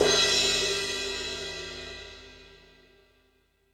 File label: Metal Drums, E-MU Pro-Cussion